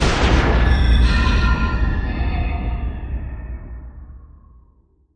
Hollow Boomer Impact And Metallic Tail

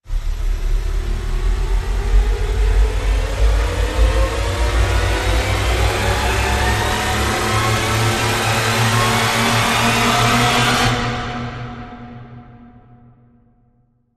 Rise Boiling Point

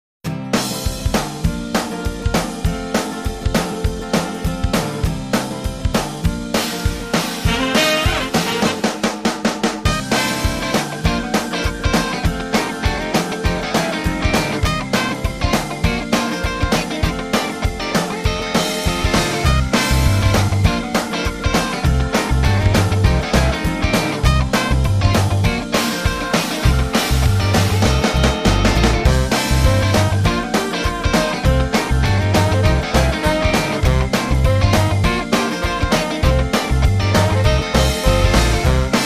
Cheesy Funk

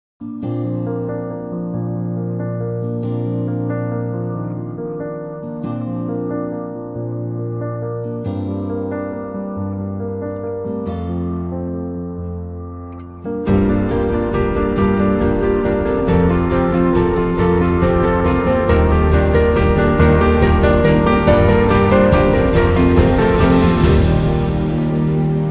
Mood Piano, Short

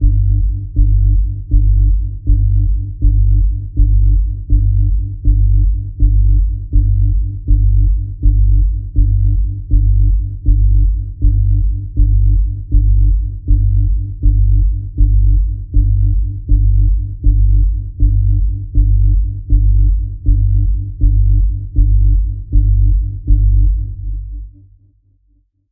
Threatening Doom Pulse G, Key of G

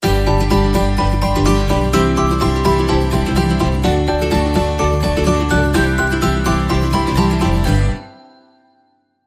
Happy Happy Happy, Stinger No Drums; 10sec

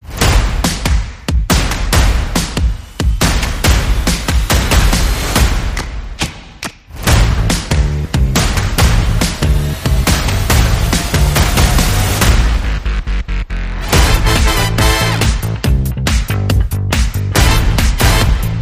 Playful Hustle, No Keys

